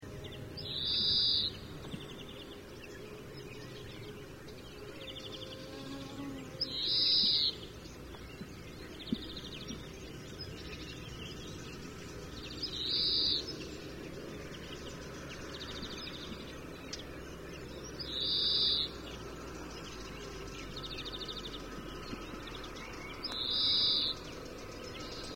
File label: Chloris chloris; SETE